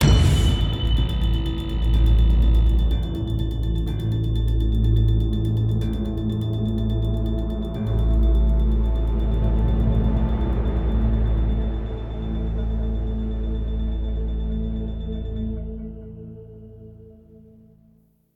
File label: Action; the walking dead michonne 1 in too deep